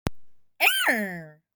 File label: battle cry; sigma gyatt sigma ohio